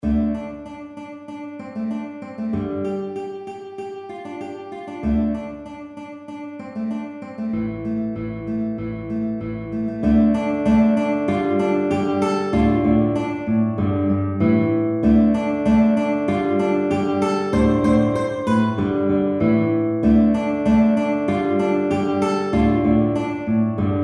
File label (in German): gitarre